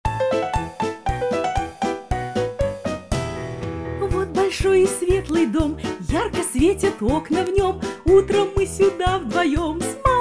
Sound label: detskiy sad